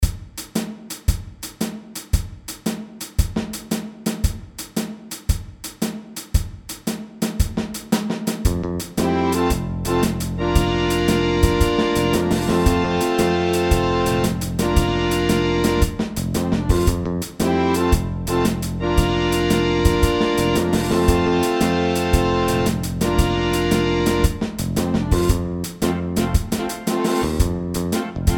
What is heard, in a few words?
Shuffle; Nytaar 2015; Nytårs-koncerter